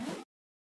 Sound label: retro game jump